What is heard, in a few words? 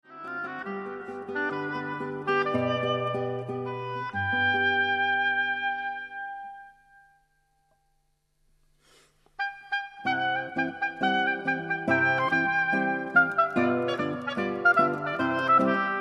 andante marcato allegro non troppo